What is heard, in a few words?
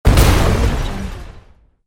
helicopter explosion